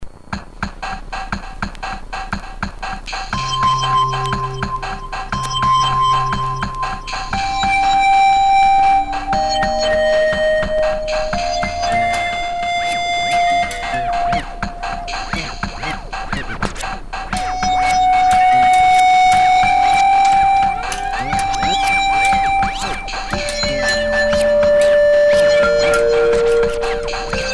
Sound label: scratchy syns